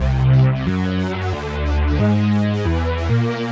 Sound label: Slip Bass 136-C, horrorscape samples 136bpm